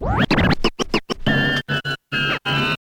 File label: scratch kit, vinyl